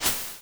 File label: Grass